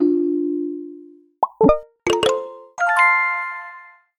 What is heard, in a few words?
notifications